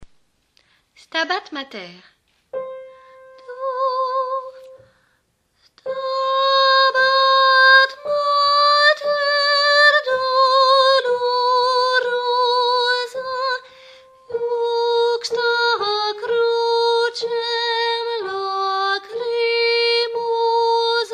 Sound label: stabat mater, tenor